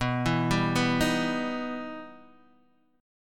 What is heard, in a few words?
E Minor Major 7th